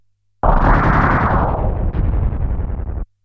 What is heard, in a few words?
pound; mmx sfx